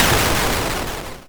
explosion, Retro Sounds; Kenney's Sound Pack; Retro Sounds 2

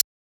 User Interface Click Button; Glitchedtones

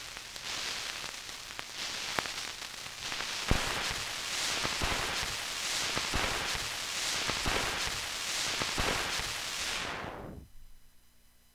vinyl crackle; 99Sounds; Vinyl Noise SFX

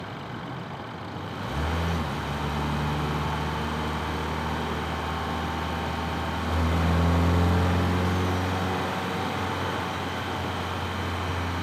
BMW 114i Engine Medium Speed Acceleration; E87; SoundHolder

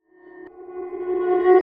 Movements; 99Sounds; The Weird Side Samples

